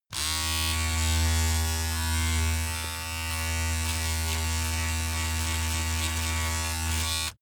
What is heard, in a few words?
razor on load pov short